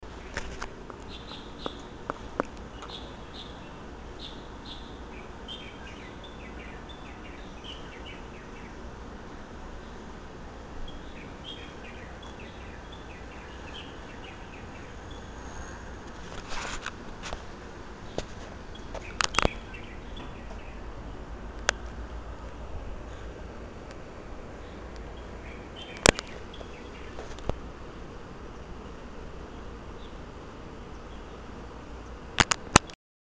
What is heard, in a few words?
taipei city ambient